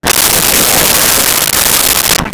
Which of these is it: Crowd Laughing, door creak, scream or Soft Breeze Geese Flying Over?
Crowd Laughing